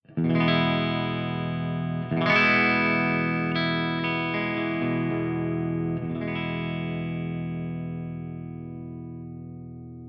ENGL SOV CH1 CLEAN V30

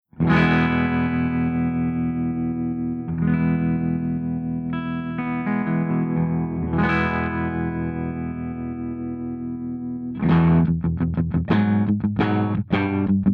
FENDER SUPER REVERB WARM TREMOLO P90

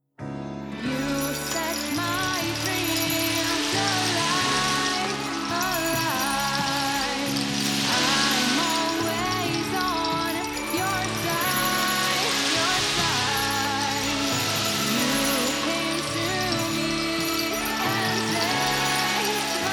BINAURAL PERCS

